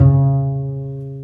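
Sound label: String, contrabass pizzicato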